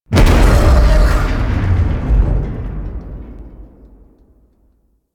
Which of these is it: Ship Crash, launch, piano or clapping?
Ship Crash